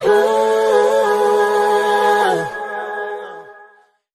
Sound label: voice hoo ooh uh uh; Loops; Phonky